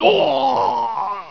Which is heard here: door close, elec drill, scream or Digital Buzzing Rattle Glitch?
scream